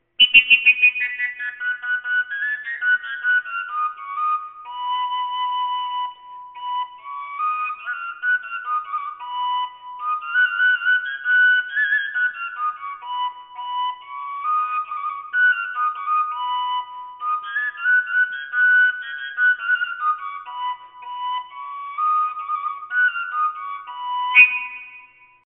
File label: koncovka h, overtone flute; whistles